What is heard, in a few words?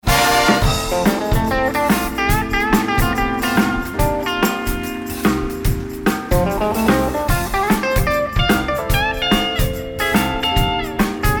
BigBand unprocessed bypass